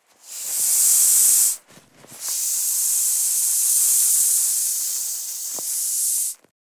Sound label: Rubber ring deflating air release; inflatable